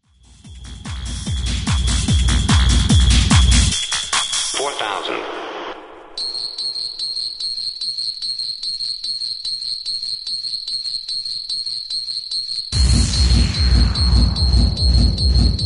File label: noise 